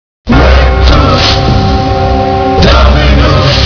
chant

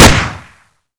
beretta; brainbread

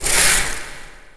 curtain

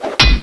knife stack; svencoop; weapons

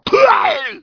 zombie death; zombierpg

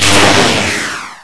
bolt end, spells; wizardwars

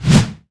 swipe; weapons

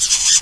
shock idle, shockroach; svencoop

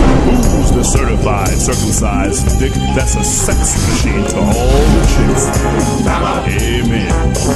hammer down; svencoop

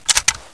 m4a1 silencer on, weapons

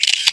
click

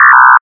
buzz off; svencoop

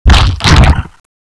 bite hand; renesaur

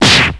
knife hit; cstrike; dbz mod

